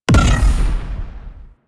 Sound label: Glaive Detonate Explosion; Warframe; Weapons; Ghost Catcher